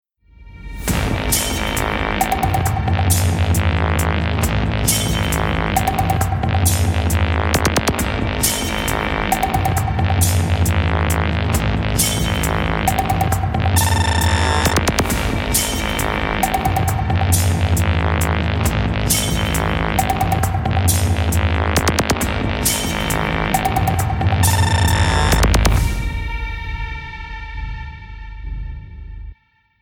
start round, music

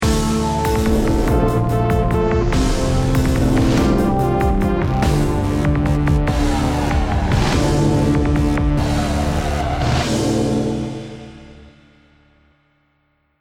round ten sec count, music

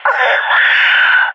death; fbihrt epic

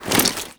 foley step, soldier; combine; soldier common

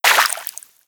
debris water, bullet impact debris

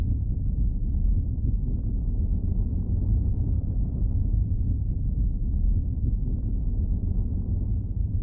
dropzone bg loop; Counter Strike Model Pack; CS:GO; survival

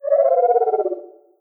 waiting call, headcrab reviver

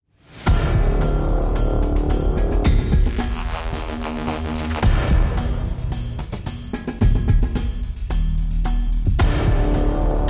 bomb planted

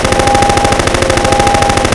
weapon fire loop, attack helicopter; Half Life Model Pack; Half Life 2

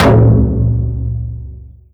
impact, metal barrel

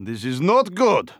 scared emote; Counter Strike; CS:GO; phoenix coop

